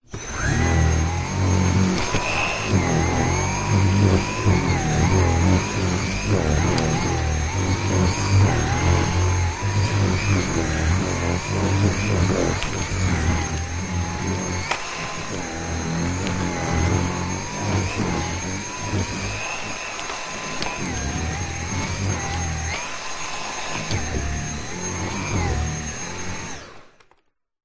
household vacuum cleaner vacuuming sofa fabric close up head long